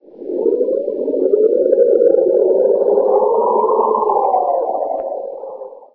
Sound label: wind moan; Garry's Mod; hl2